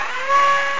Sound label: WINCH